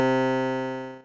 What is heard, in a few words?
synth, amiga med